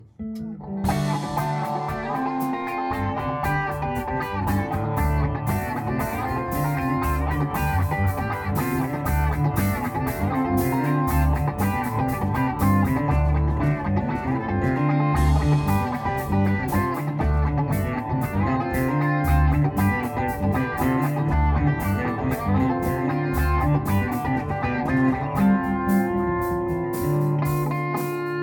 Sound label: Funky Part